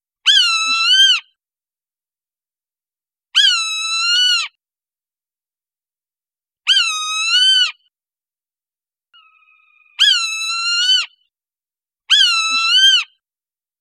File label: Swainsons Hawk; ASU; Ask A Biologist